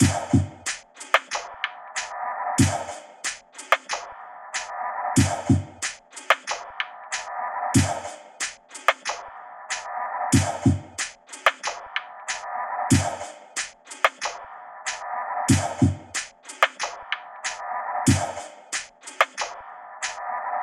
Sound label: Bang Perc Loop - 93 BPM; Cymatics; Fiji Hihat Loops & Percussion